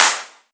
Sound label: Claps; Vengeance - Essential Clubsounds Vol.5